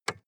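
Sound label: sports car interior lightswitch; verkehr transport; autos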